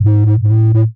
BASS SHOT HOUSE 125 A; eJay; Singleshots

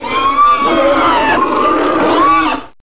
Pig; The Ambrosia Times

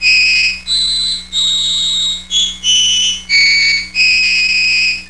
tiny vln

